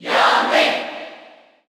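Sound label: Cheer English, crowd; Young Link; SSBU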